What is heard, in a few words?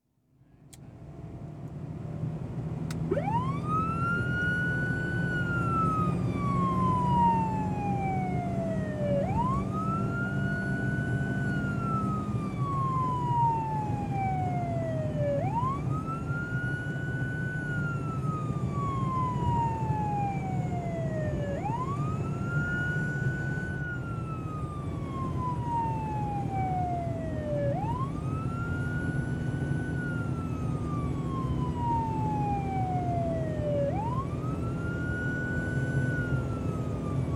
Police Car Constant Run With Wail; Emergency; BBC Sound Effects Library